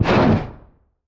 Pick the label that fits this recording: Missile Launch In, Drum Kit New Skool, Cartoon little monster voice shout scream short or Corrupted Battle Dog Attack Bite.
Missile Launch In